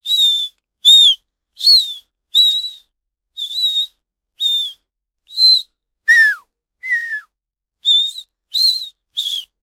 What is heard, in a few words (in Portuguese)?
som de respiro abafada